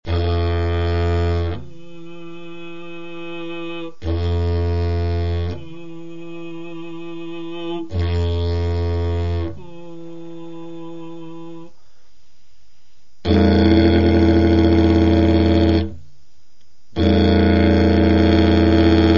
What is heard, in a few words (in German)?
Grundton mit Stimme, Didgeridoo